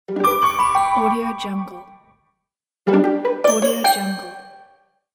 simple bell logo

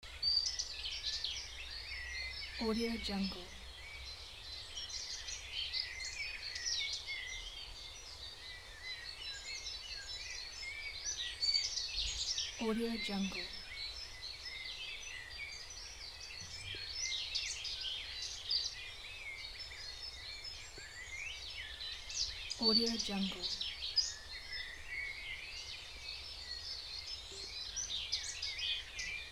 birds singing ambience loop

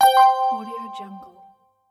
ui pop up

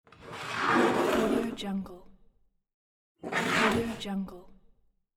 sliding cup

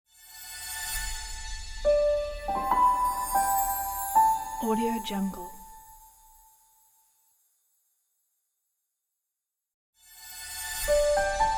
glossy shiny logo; kaelv

